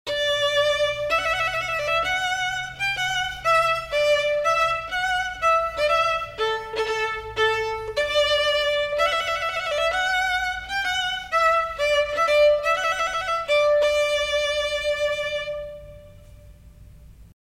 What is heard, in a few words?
Solo Trumpet Voluntary